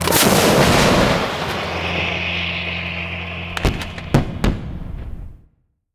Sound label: Tank Explodes; TankTutorial; Unity